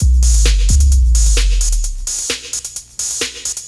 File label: NRG Break, Trance, Drums, Breaks